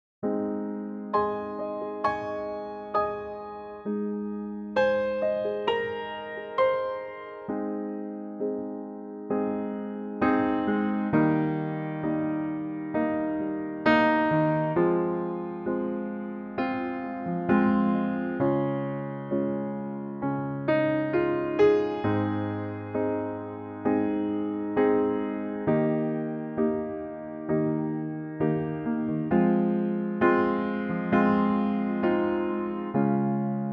piano; key - Ab; Pianotrak; Piano only arrangement

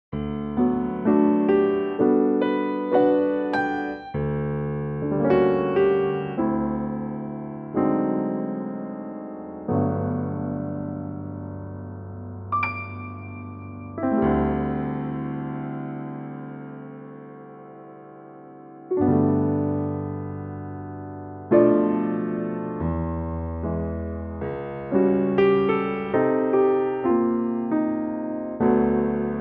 piano Ab; key - Ab (verse in G) - vocal range - Bb to Eb (optional F); Pianotrak

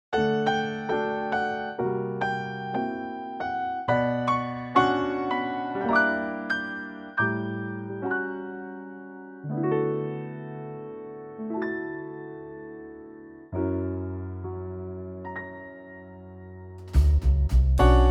D Eb trio

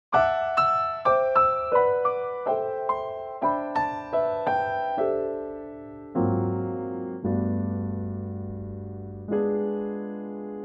G trio

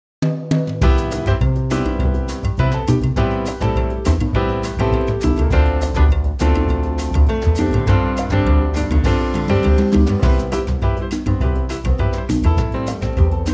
latin C

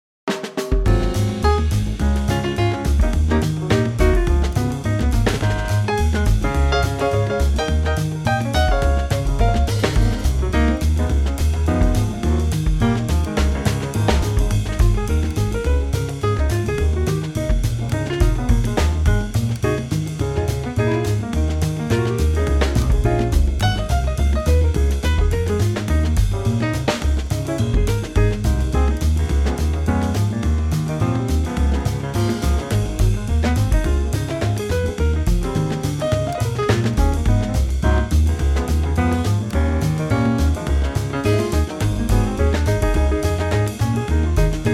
Ab trio

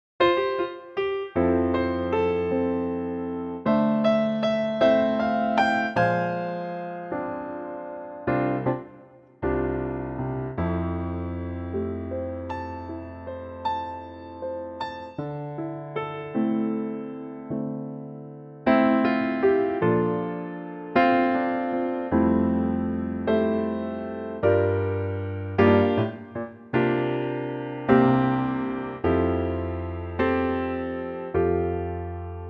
F piano; key - F

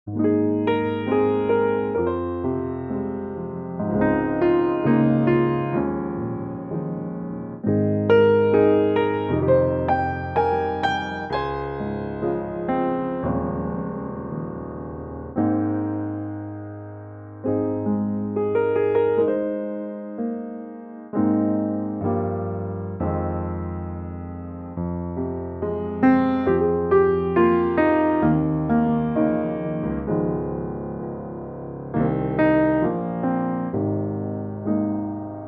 Ab Piano; key - Ab - vocal range - Ab to C; classy piano only arrangement

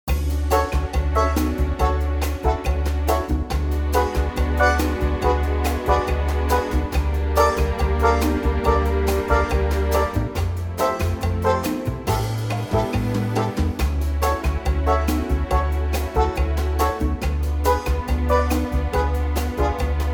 D Bossa; D orchestral; key - D - vocal range - B to D; Wonderful orchestral Bossa arrangement of the classic standard

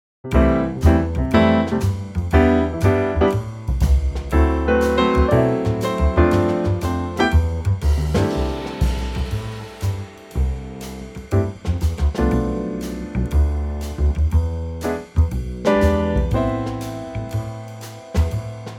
C Trio

